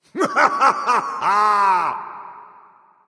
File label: thor laugh; smite; voicepack